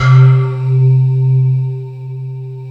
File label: ooh pole C3; Synth